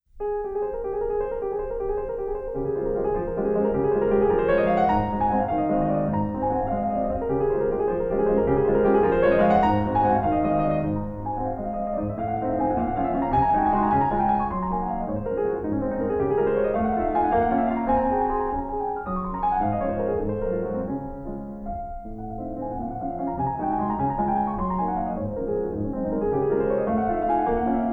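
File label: Waltzes, piano; Chopin; No64-1; Lympany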